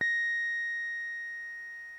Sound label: ebm, Omnichord; OmnichordGodot